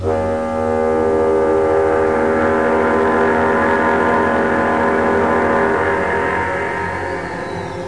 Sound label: foghorn